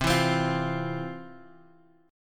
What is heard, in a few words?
C Major Add 11th, guitar chord